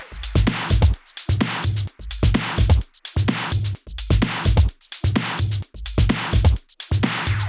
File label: 128BPM Kit; Vengeance Samples; VFH2 128BPM Automata Kit